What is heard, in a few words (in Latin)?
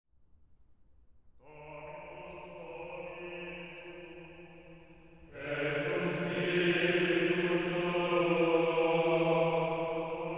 oratio (chant) dominus vobiscum oremus.deus, qui ho; sacred choral; venetian easter mass